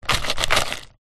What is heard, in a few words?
food crisps potato chips crumbs shake packet box